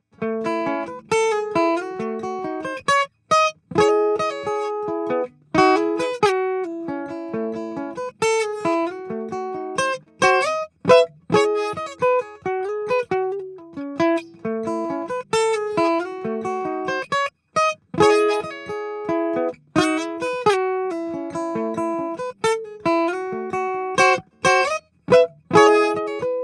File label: J45 AKG CS1000